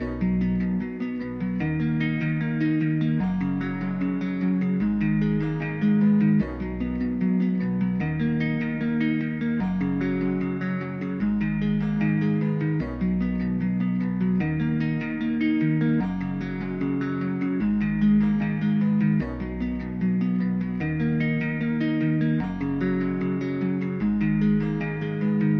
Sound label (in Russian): zatsiklennye melodii dlia gitary loop